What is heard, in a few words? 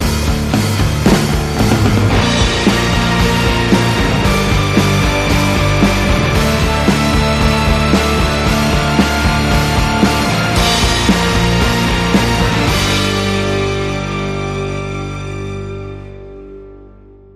Bumper